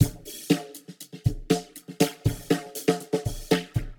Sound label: 120 Psych Break, Warped Live Drums; Loops; Touch Loops; CosmicFunk